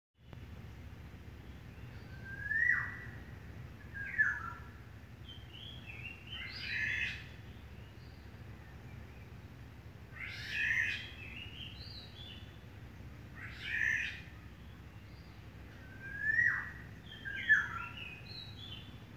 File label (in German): Vögel